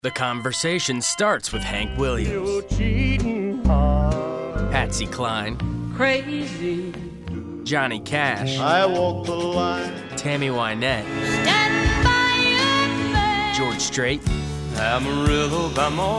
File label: COUNTRY MUSIC